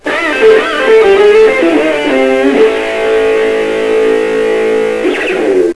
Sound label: riff